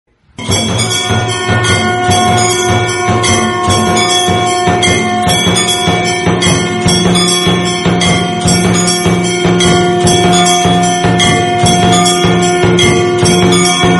temple aarti sound with sankh

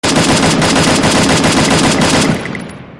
gun sound notification; cod

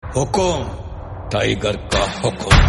message notification; jailer

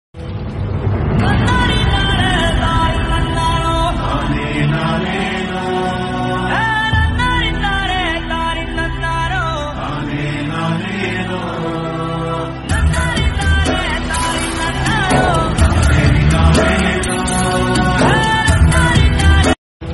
mother emotional bgm; kgf 2

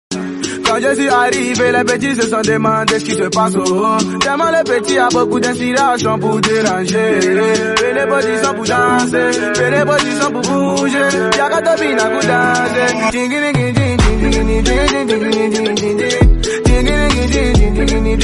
musicbeat

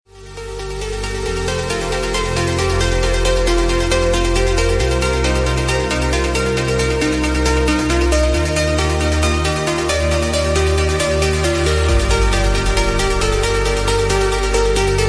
electronica

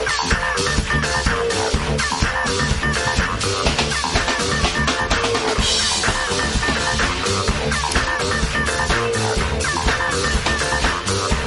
instrumental bgm

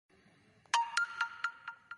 infinix notification tone

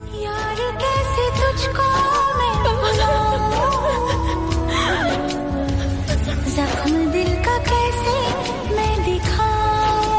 romantic hindi bollywood

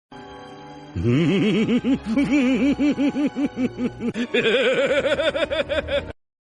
laugh; ketawa; doflamingo

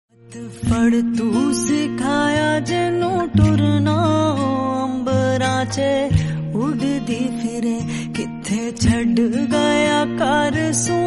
punjabi folk song

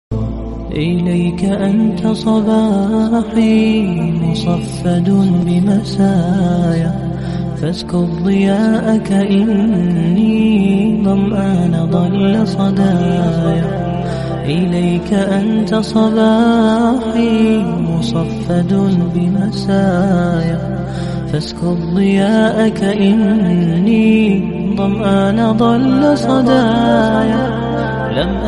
islamic lofi naat arabic

